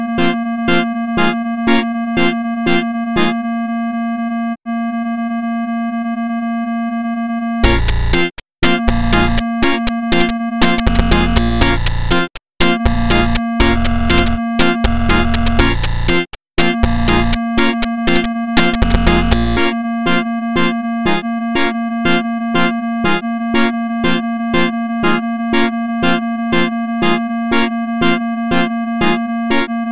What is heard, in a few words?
8 Bit; Emulation 8 Bit Arcade